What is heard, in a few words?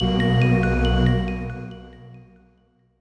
Level Up; Last Bible 3; snes